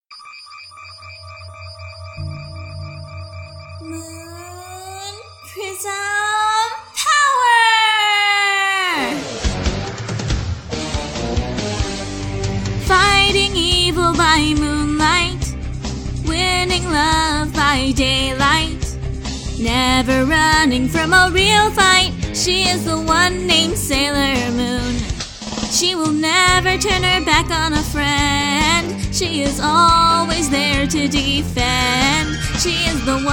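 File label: [F] [Cover], female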